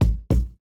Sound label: kick; recorded from hise sampler and macos finder